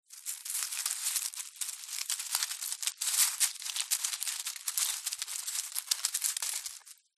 animals insect spider scuttle undergrowth leaves dirt